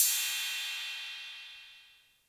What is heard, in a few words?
Cymbal 808 Color